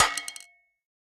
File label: Break Held Weapon Sweetener